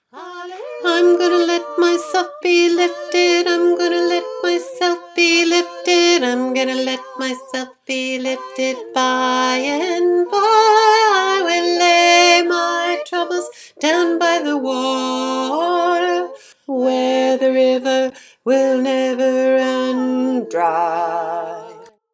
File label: halleluja refrain in f bass